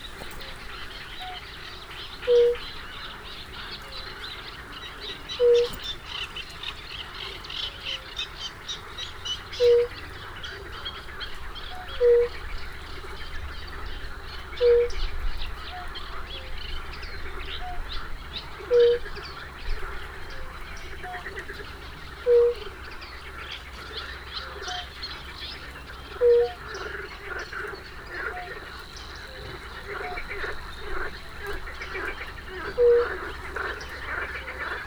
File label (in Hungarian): hortobágyi halastavak, unka; allathangok; termeszetben